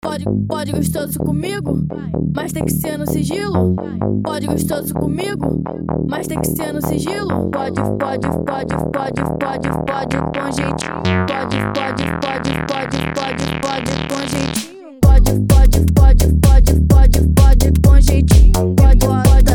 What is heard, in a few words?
BASE 128 BPM, void minimal tech